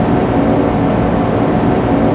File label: Oilrig Ambience, ambient; Totaled; XBOX